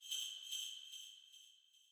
Calm; super mario maker 2; Peaceful SFX Raws - Otoasobi Calm SE

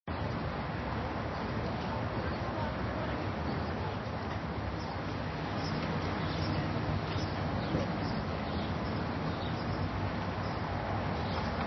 Ambience; Angry Birds McDonald's

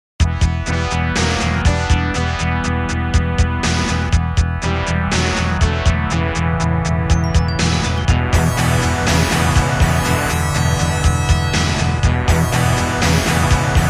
Underwater Stage

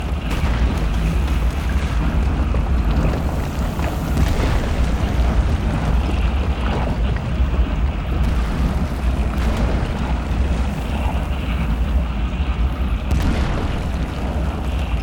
ambience; batcave fight; Lego Batman 3 Beyond Gotham

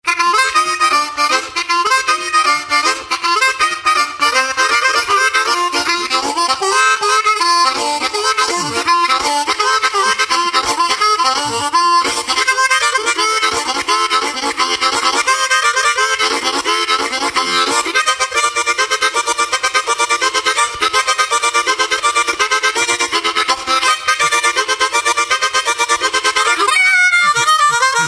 Dixieland Train; Railroad Tycoon 3

